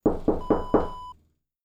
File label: door knock